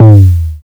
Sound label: TOM, Drums Dance & Groove; USB Soundscan vol.11; AKAI